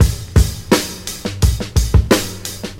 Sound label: 86 bpm drum groove c sharp key, kick tuned to the C# note